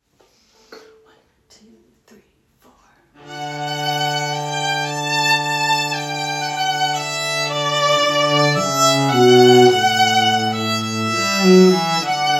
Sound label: Cello Violin; wedding; Ceremony & Cocktail/Dinner Music